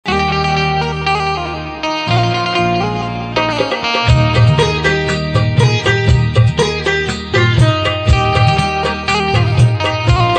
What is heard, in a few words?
Banjo Instrumental Ringtone